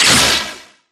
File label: imp bullet lightballistic shieldwall sizzle